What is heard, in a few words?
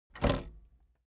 tape deck bounce